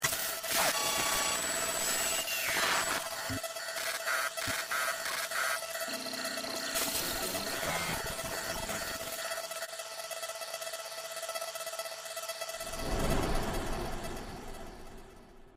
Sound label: hacking sound; burntrap; FNAF